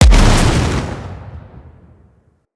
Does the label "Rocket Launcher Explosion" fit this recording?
Yes